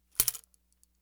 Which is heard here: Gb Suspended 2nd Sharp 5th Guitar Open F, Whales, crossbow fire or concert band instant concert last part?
crossbow fire